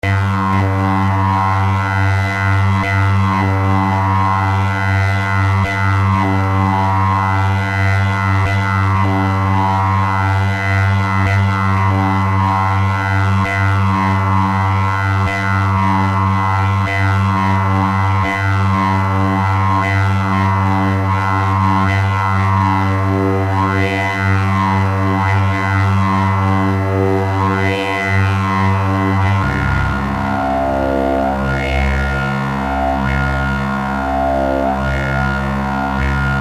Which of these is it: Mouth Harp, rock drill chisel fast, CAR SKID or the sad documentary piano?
Mouth Harp